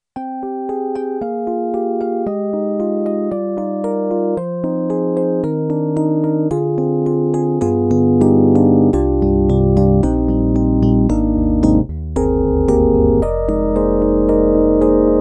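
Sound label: EDIROL SD-80, Electric Piano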